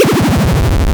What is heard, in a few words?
gameover; Kenney's Sound Pack; Retro Sounds 2